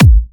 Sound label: 126BPM Kick; VFH2 126BPM Secrets Kit